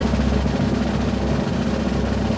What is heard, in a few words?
Wheel Enter Loop; Ui Combat Wheel Enter Loop